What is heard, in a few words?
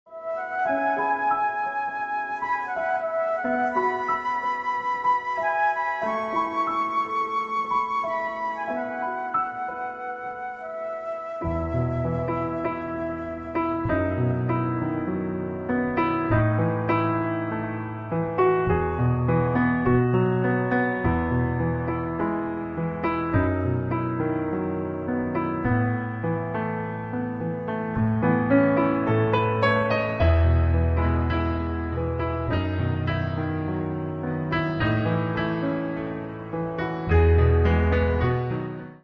titanic piano